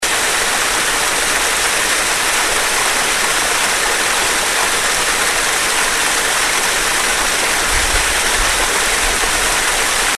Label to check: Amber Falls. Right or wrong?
Right